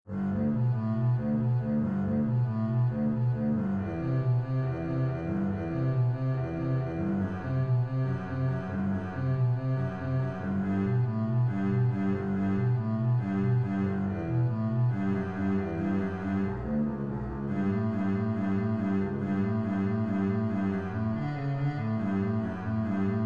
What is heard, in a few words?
prelude; prelude full